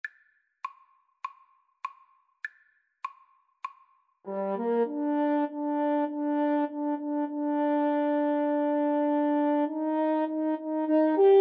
French Horn Duet

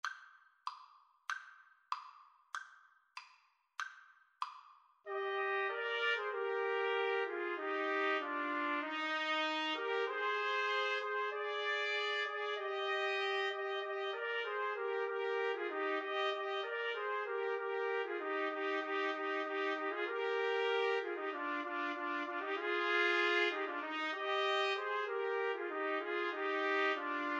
Trumpet Trio

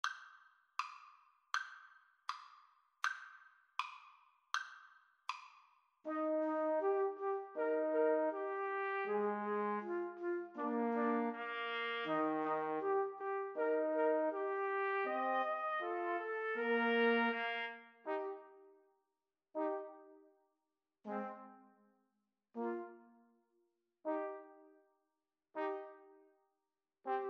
2 Trumpets Trombone

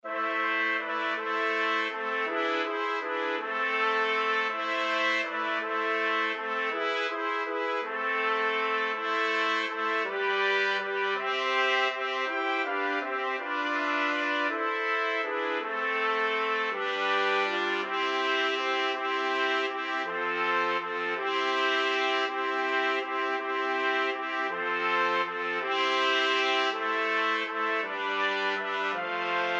we three kings 4TPT, trumpet quartet

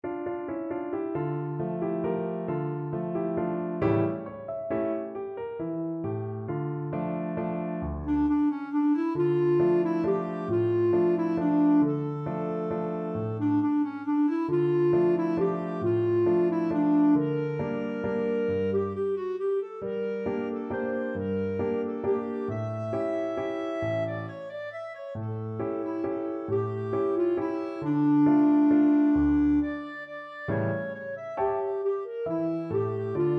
lawiswis kawayan, clarinet

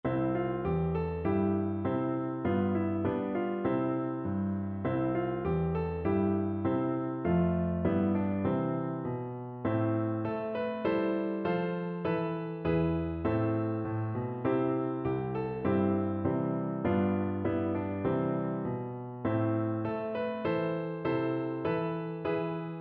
ner li, piano

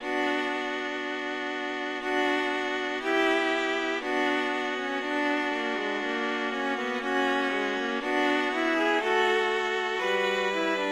string quartet; dowland go crystal

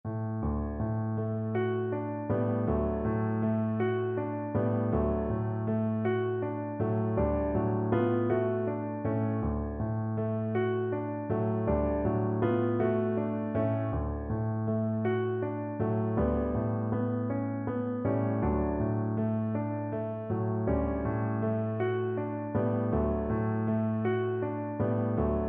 ancient lullaby, cello

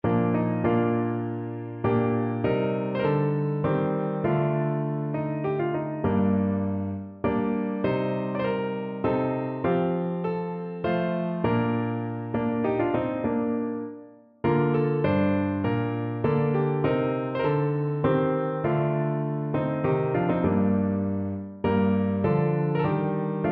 piano

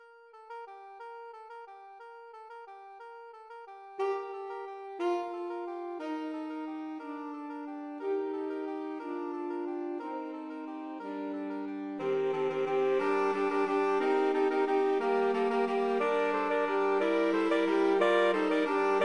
carol of the bells SAX quart

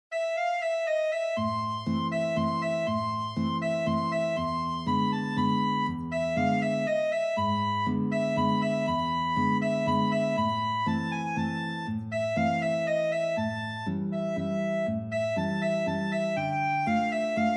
guitar clarinet duet

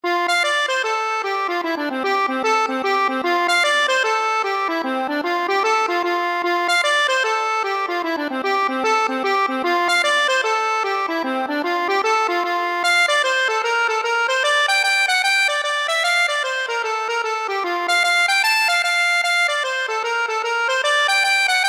accordion

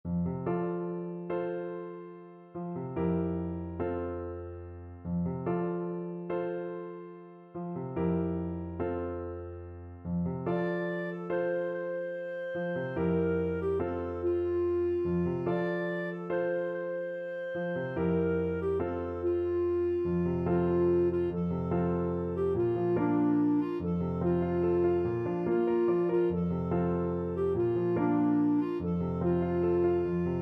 abiyoyo, clarinet

